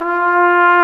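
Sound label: brass trumpet, Roland Solo Brass; Tpt p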